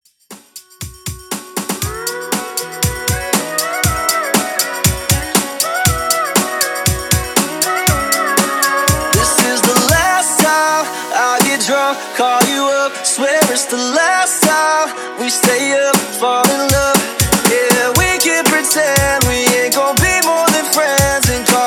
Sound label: Country Top40s Uptempo 119 BPM